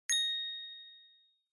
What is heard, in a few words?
Inspiration High; Onoma